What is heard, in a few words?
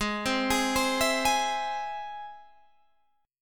G sharp Augmented G sharp Guitar Open G